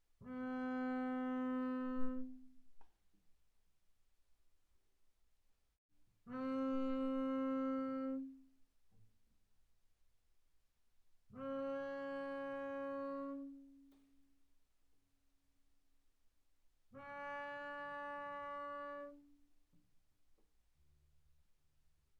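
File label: Bass arco sul D mezzo forte C4 D4, double bass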